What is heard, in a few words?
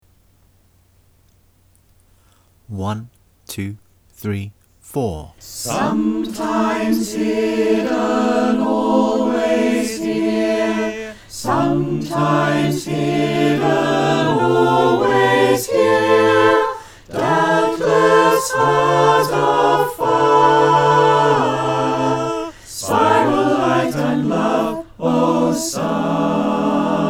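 soprano alto tenor bass Mix, choir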